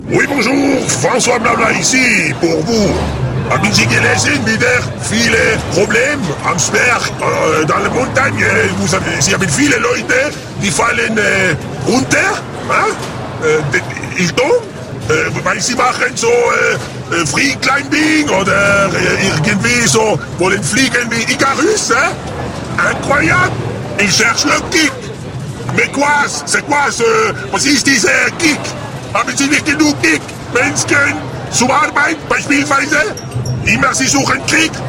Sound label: kick